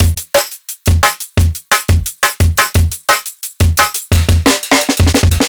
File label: Live Jungle Drum Break, 175; JungleBreaks24